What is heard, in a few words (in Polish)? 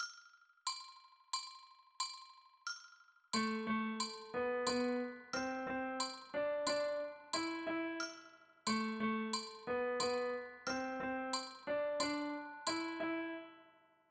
Kolejny wariant - przesunięty o jeszcze jedną ósemkę, Z podkładem